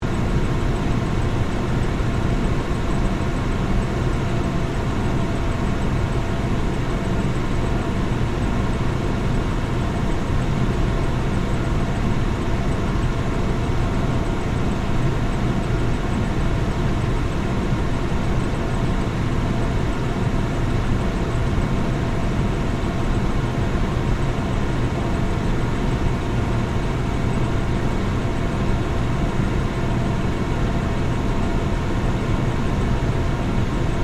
Ambience Furnace, Industry, Ambience, Furnace, Room, Loop; Drills; Tools; BlastWave